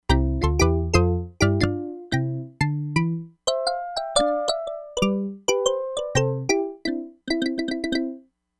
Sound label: MARIMBA, Crumar Bit 99; Factory Programs